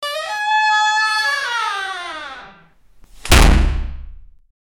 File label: angry door slaming